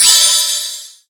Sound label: Enemy Fashion Heal